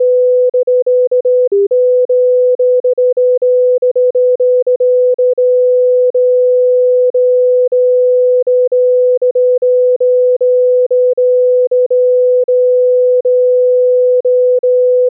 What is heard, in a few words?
duration non beat 192 ms